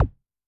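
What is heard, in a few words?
bass drum Rave, Kick, Yamaha DTXpress-II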